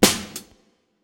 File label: Snare